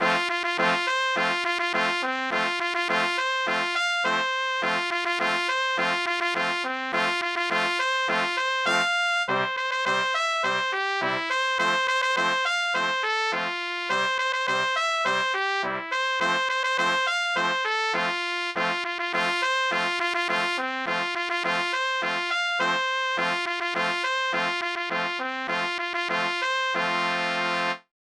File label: Trumpet Solo